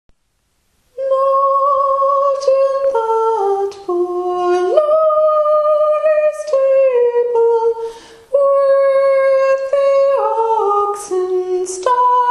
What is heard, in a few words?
descant sopranos; once in royal davids city